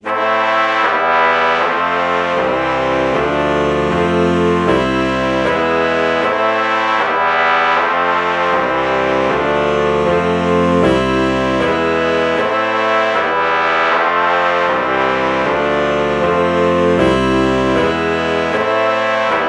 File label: alto saxophone trumpet chord morph